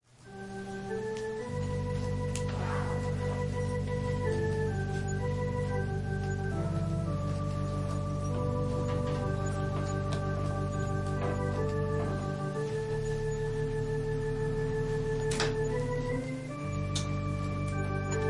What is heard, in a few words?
Organ prelude